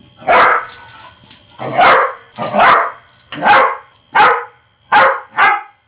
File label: barking